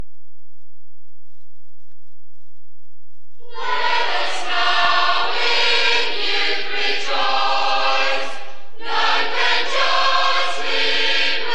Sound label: Senior Chorus; Gaudeamus Igitur; musical memories of 1968